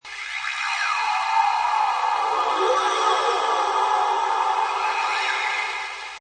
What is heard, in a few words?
Space Scifi Sound Strange Atmos, Sci-fi weird osci; Magic, strange teleportation device or machine; Sci-fi weird oscillations; Lynne Music